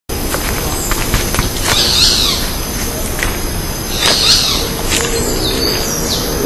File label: Megarynchus pitangua; PP Araucaria; Jorge La Grotteria